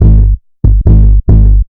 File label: Dogon Maqsuum Filtered Beat; Sonic Foundry (Sony Creative Software); Loop Noir